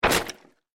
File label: Box; Google Snake